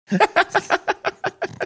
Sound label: chuckling